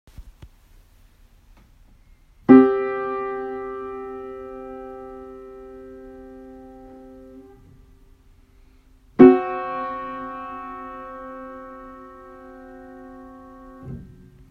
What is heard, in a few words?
Perfect 5, Tritone